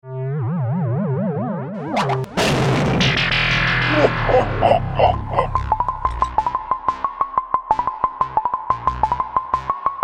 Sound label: Cut Boss Surprise; paper monsters recut; android; wii u